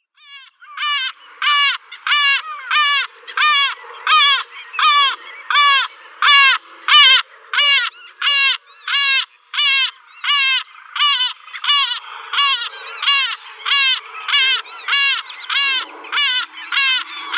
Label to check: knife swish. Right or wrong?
Wrong